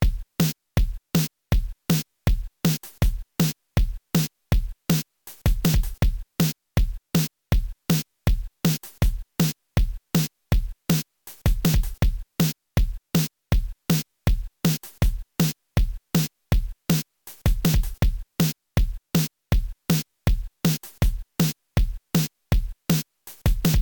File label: 160 bpm, Takt